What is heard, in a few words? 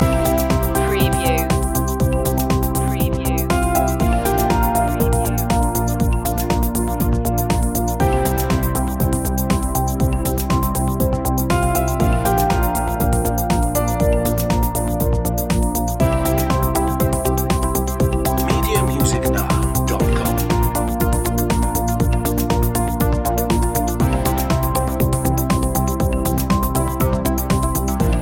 loop, Pop Electropop, A light electronica track with a moderately uptempo, bright feel